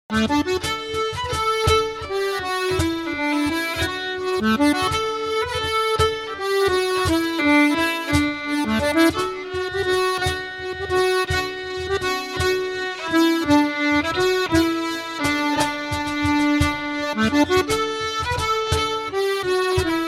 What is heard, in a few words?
VALSES; Maguire and Pattersons